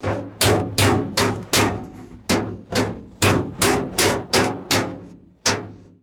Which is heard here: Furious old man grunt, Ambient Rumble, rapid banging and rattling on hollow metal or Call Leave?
rapid banging and rattling on hollow metal